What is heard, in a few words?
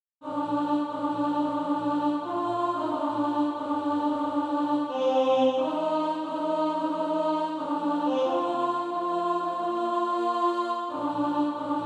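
alto, SATB Practise Music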